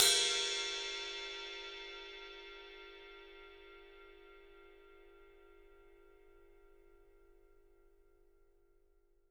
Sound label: PW RIDE, RIDE CYMBAL, 3D Drums; 3D Sound